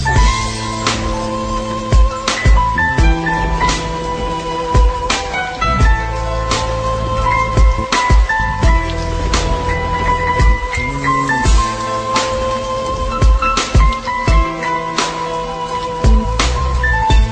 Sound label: Armenian Nice Bit